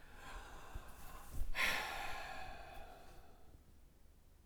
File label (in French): soupir; Index des soupirs 5 – Hadjithomas Joreige